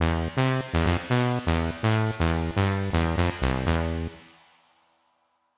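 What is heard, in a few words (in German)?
tröt tröt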